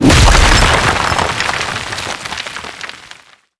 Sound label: destroy, brickwall, destruction